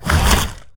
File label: worm bite